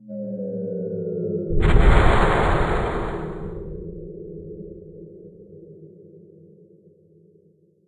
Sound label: explosion